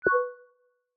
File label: voice earcon confirmation